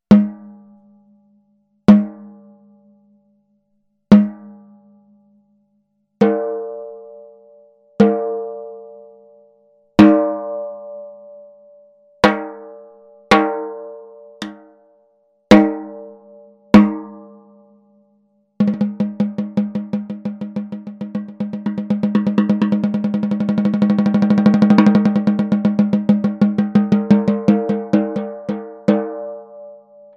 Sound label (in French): TAMA STRACLAS MAPLE 14X65, CAISSES CLAIRES